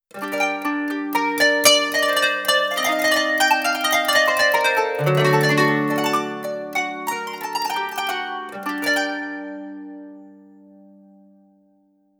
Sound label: Yamaha PSR-A5000 Qanoon